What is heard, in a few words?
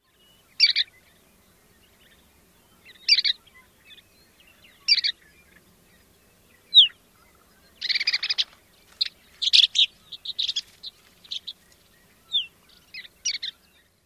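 Chestnut-collared Longspur calls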